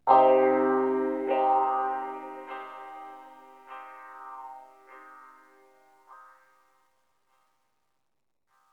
Oneshot Synth

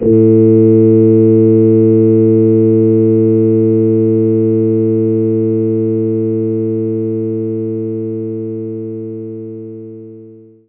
Hammond Organ

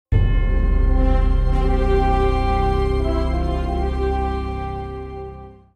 Jingle Village; Jack Keane

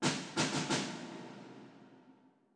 orbit snare; Sky Children of the Light gamerip

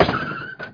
Crash; Buggy; PS1

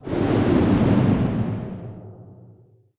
Dark

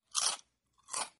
chewing sound; Caravan Beast

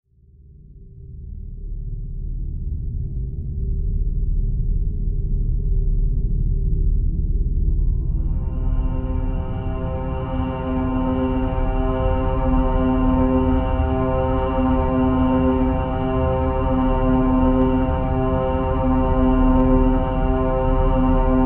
Horror Ambience Long Build Up

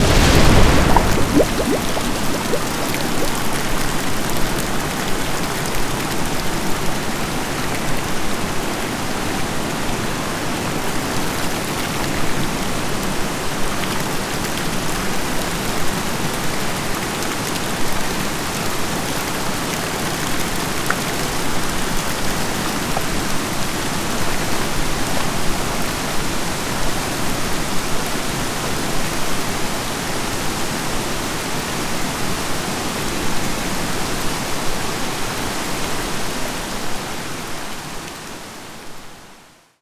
Water Explosion

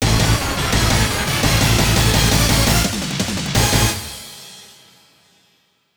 bgm gameover; bullet soul

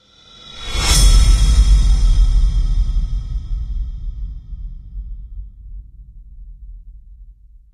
Swell; friday the 13th killer puzzle

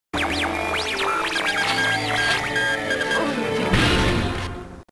Logo; Digital Pictures

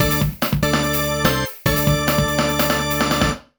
Jingle OVER; Mr. Driller Drill Spirits; nintendo ds

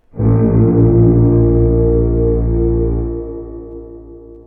Horn

